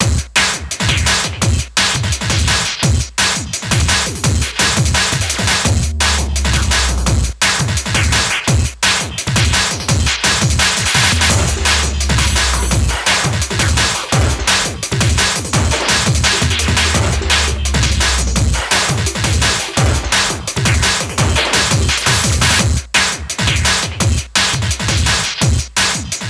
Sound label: Bangin' Beats Loud; pro evolution soccer 2008